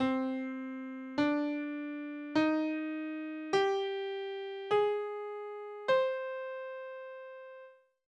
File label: Hirajoshi scale on C; Kostka-Payne